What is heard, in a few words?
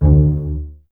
BSS D 2 Pizz, String Ensembles Basses; Miroslav Vitous; 90 s Sample CDs